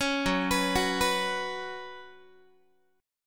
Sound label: F sharp Suspended 4th, Guitar; arpeggiated